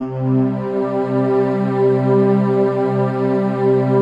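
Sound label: PAD, atmospheric pads; Best Service; XX Large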